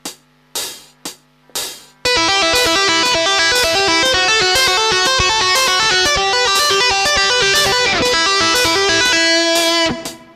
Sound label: tap